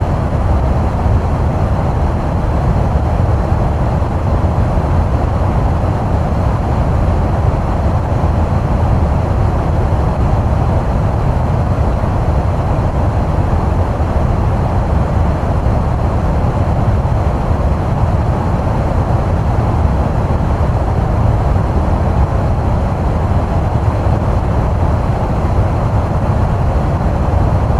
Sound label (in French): ventilation cuisine, appartement